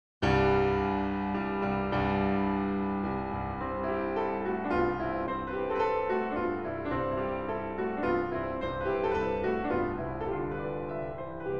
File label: Mazurka in C major, Piano; Chopin